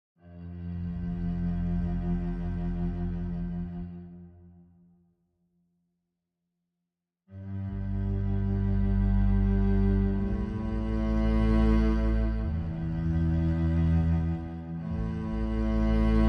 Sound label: Cello Only